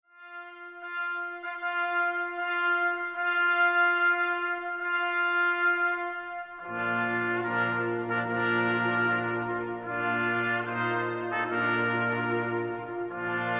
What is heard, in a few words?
bridal chorus, brass